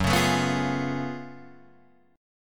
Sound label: Gb Minor Major 11th Gb down Guitar Drop D